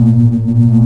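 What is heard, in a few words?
eng idle low loop, ships engine; Quantum Redshift; darius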